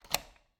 Cabin, front door, deadbolt, lock, latch, thick, open, wide; Eiravaein Works; Vaeyan IV